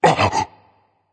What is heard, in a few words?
surprised berserk; cuchulainn